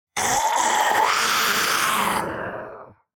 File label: Demon beast imp monster attack shout